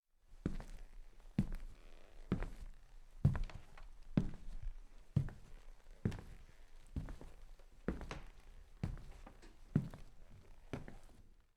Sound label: walking wood floor house